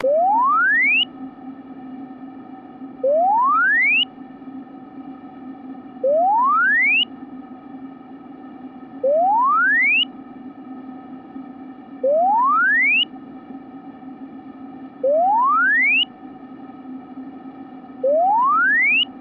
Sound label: sweep 500-3kHz; Mic1 tubetto; LaSpezia